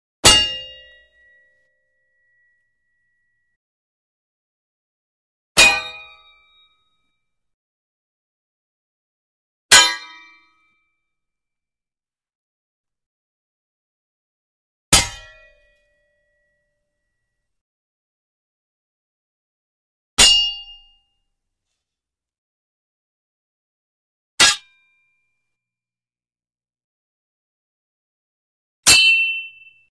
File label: Sword Clanks Medium Single Fat Sword Clank With Ring; Hollywood Edge Foley Sound Library